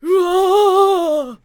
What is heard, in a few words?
Harvester Attack Vocals